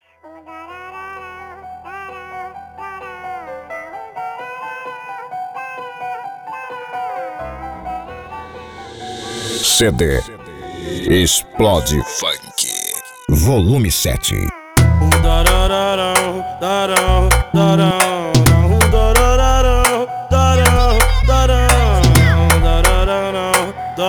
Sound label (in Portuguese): explode funk